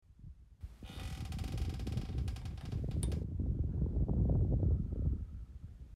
Background wind and creak - wind produced by gently blowing into my iPhone mic and the creak is a recording of my office chair